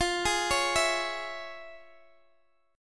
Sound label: F Minor Major 7th Sharp 5th, F, Guitar, Half Step Down